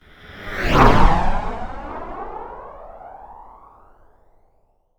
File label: casual start